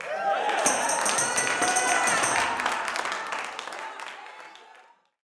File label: OUTBURST, Gospel